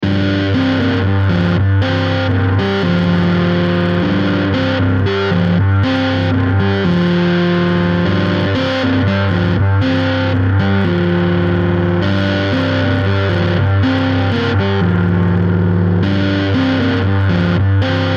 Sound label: G120 Riff